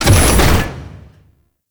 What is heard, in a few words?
Standard Door Open Mech; ambience; Warframe; Entrati